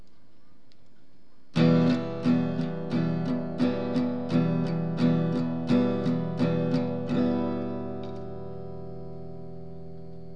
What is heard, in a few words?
strum steel other picks